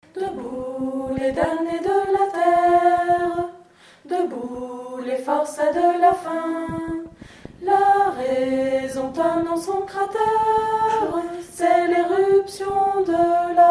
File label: soprani; Internationale